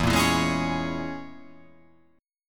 E 6th Flat 5th, down; E6b5 strummed; JGuitar

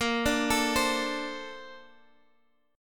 A sharp Major 9th, down, Guitar, Half Step Down

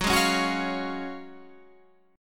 F sharp Major 7th Suspended 2nd F sharp

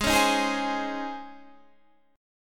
C sharp Major 7th Suspended 2nd, G sharp

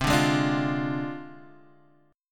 D Minor 7th Flat 5th C, guitar chord

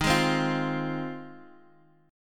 F Minor 7th D sharp

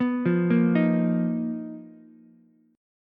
B Major 7th Flat 5th B down Ukulele; BM7b5; arpeggiated; JGuitar

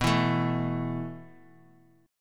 B 7th Suspended 4th B down

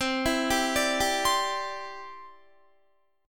C Major Add 9th, Guitar, Cadd9 chord; arpeggiated; JGuitar

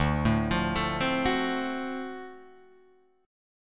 D 7th Sharp 9th D down Guitar Drop D

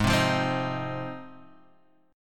G sharp 6th, Guitar, Half Step Down

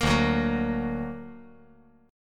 D Augmented, chord

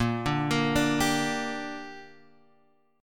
D Suspended 4th Sharp 5th A sharp, chord

